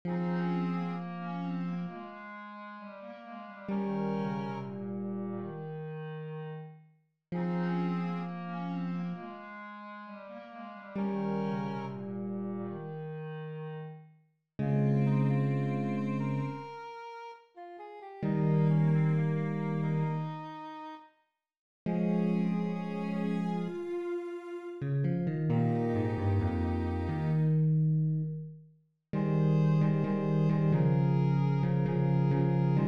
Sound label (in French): melodie